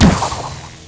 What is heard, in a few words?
arrow; dota; drow ranger